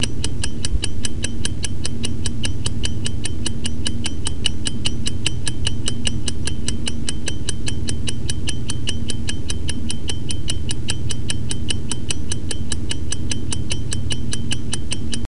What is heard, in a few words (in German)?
Zylinderuhr